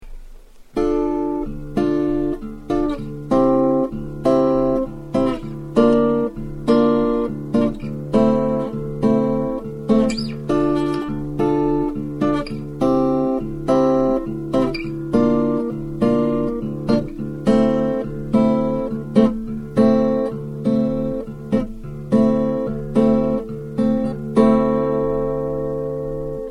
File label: Minor Bounce